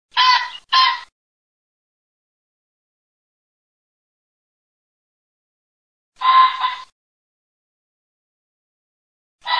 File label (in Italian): FAGIANO